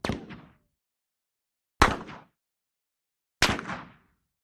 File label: Russian Sks: Ricochet, Single Shots; sks 7x6